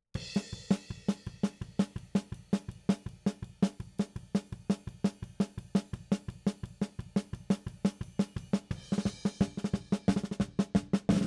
SNR top

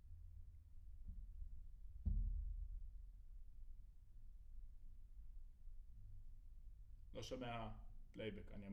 Room A

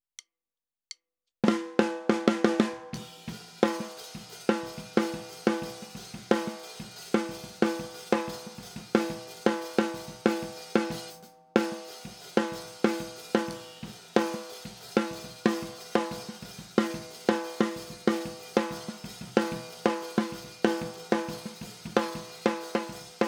SNR

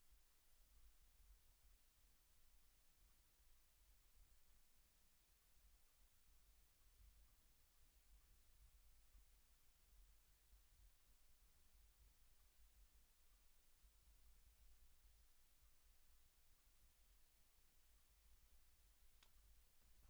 Drums

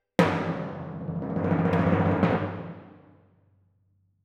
Twin F Timp

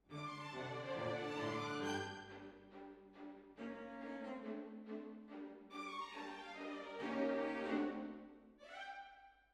Hall

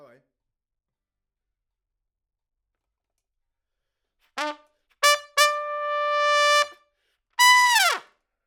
Trump

